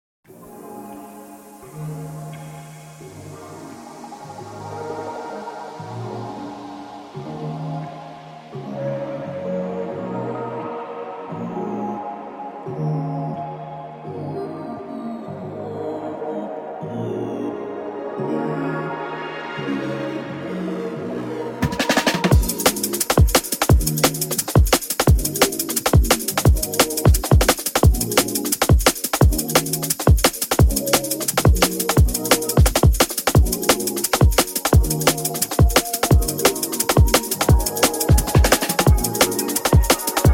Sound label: drum and bass